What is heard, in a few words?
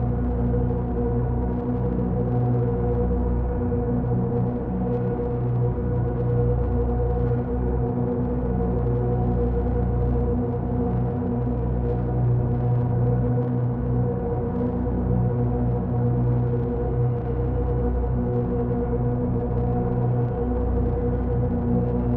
ship colossus idle; Stellaris; shroud; ships